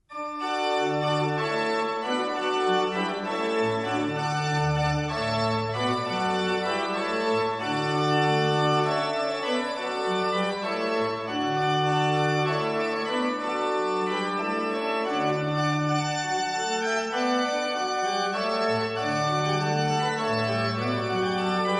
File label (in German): orgel, ciaccona C-Dur; bengt tribukait; die andreas kahrling orgel von 1705